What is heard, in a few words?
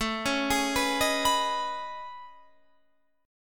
A Major Sharp 11th, Guitar Open F; AM#11 arpeggiated; 21,20,20,18,18,18